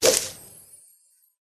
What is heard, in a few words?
fur tree attack voice mid